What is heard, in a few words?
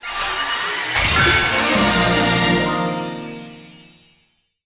Grade Max; disney star smash android ios mobile gamerip 2020